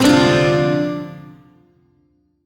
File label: Shawzin Min Chord; Warframe; Duviri